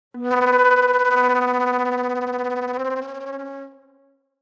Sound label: Sharp Delay Flute